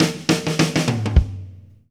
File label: Fills, 102; Zero-G; Monster Beats